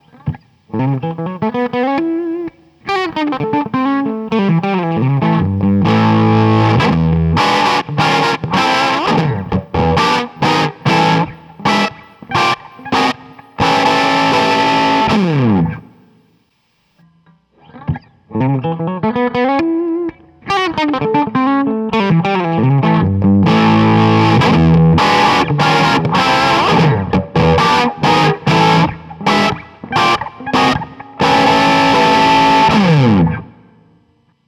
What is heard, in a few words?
bassman; axe-fx-ii; dweezil